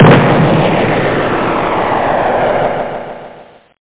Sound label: dist expl; rox